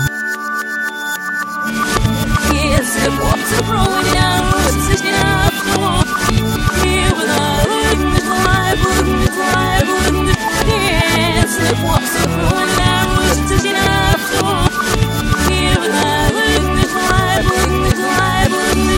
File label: jingle bells